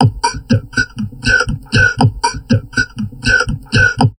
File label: TALK, Drum Loops Crazy Processed